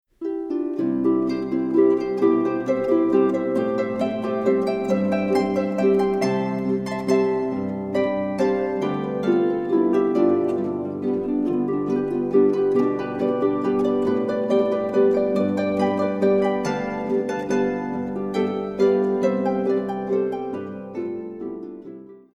Harfentrio, Harfenlandler

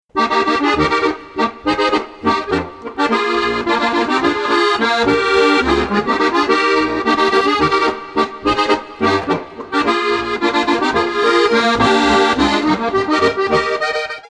Walzer

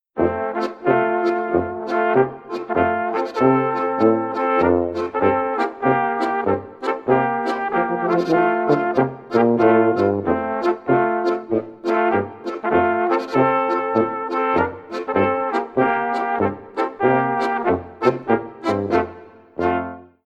Polka; Zahoracka Banda

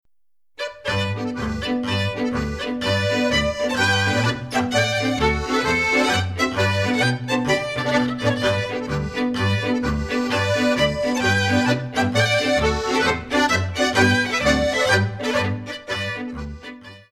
Weinpolka

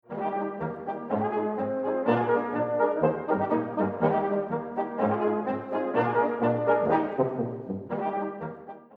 Familienmusik, Polka

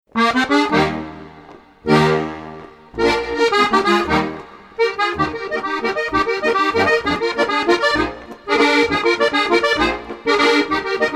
Laendler

